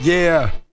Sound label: VOCALS; Ueberschall; Jam Box